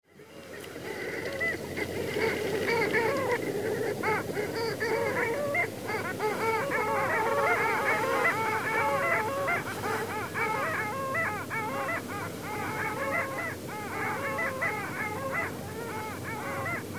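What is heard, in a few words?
Long-tailed Duck; koe; wakkanai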